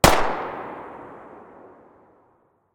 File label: tt33 muzzle mono distant